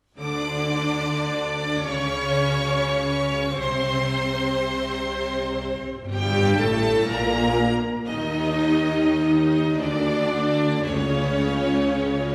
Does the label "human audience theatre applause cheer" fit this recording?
No